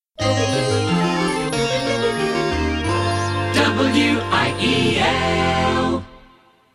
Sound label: Christmas Kit; JAM